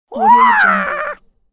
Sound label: cartoon surprised gurgle bird